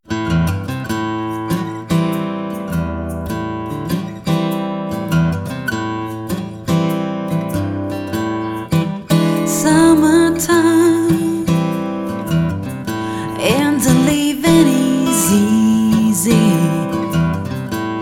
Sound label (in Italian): chitarra voce